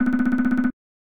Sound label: Jingle; Mario Paint Prototype Unused